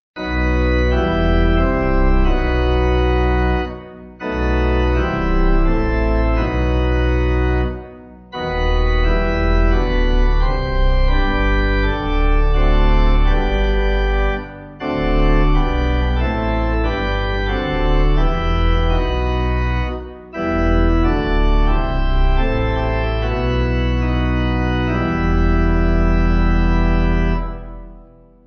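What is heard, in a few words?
Pipe Organ; O Darkest Woe; O Traurigkeit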